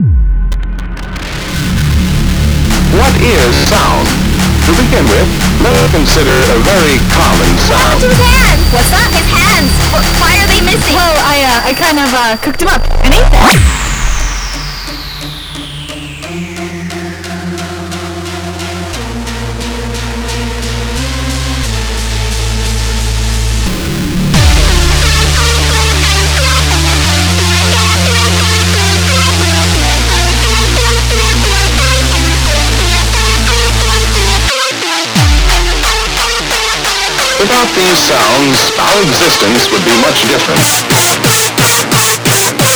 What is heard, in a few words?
Bass; Less Noise Sensitive